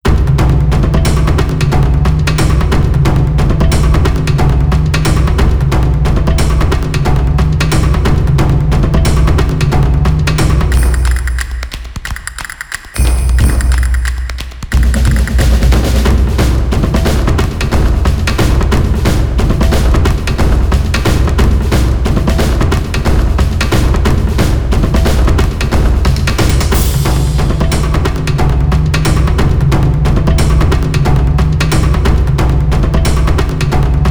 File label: Knot Tension Bat Excitement; Verdia Gensoukyoku